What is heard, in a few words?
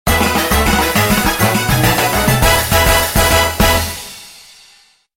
win huge; Ultra Sevens